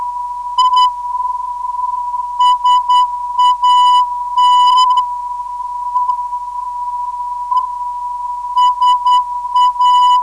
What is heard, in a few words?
363.98kHz, NDB